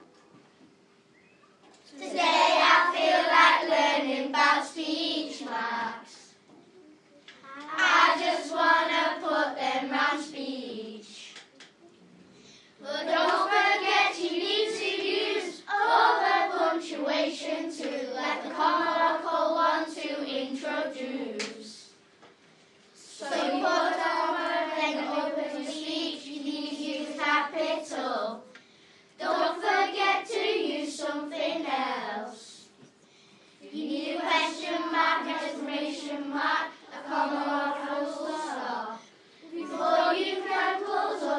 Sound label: speech marks song